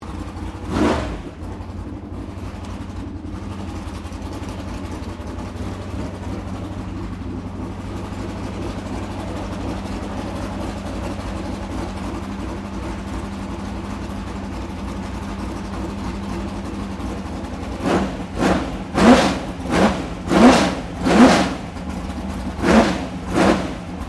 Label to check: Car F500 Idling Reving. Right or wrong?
Right